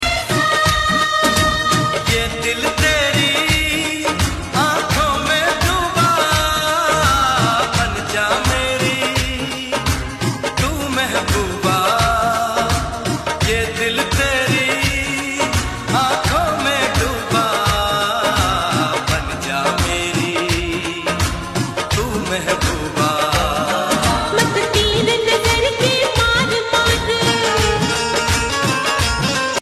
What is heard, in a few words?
Ringtone